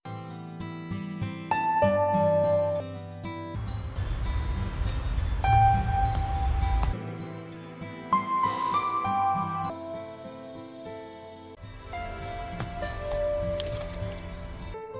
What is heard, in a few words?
Guitar Theme